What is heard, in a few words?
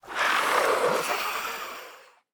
snakeboss idle, monster